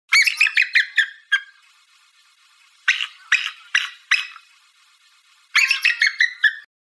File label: Chatter Call, Bald Eagle